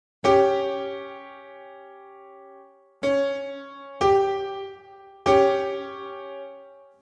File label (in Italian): esempi tritono